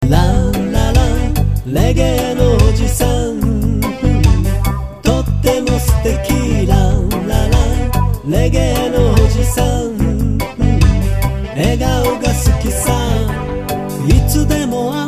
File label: reggae; Reggae no ojisan